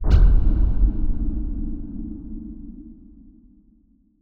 deep hit; slam

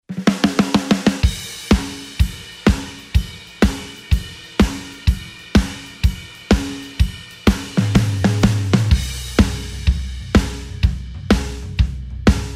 125 bpm 4-4 tom groove shuffle

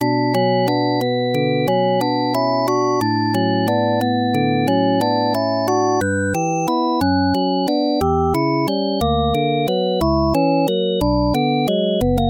percussive organ

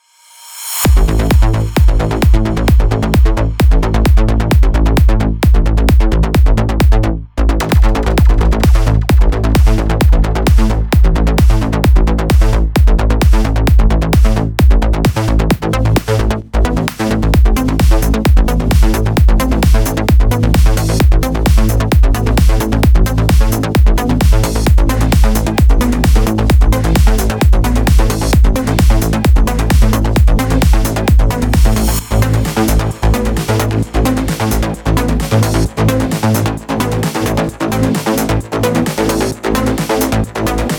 progressive bassline

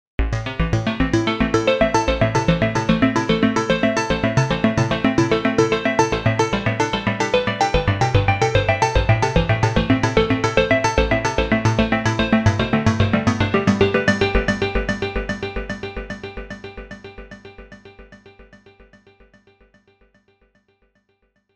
closed pulse arp; opxpro3

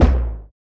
kick

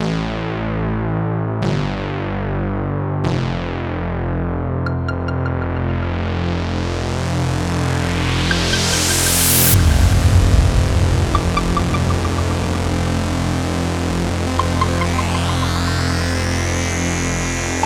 wave slicing oscillators

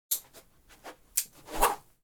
fight knife switch blade swipe

